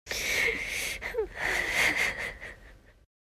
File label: Woman sigh and cry with a whimper